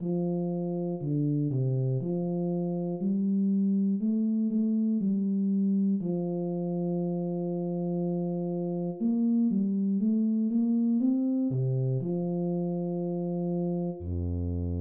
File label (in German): bass